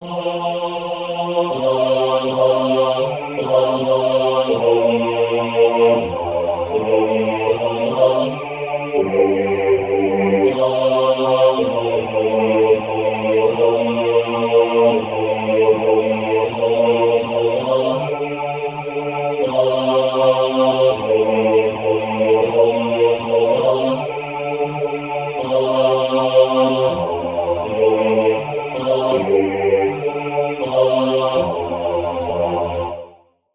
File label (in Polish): Bas na tle SATB; Aniol pasterzom mowil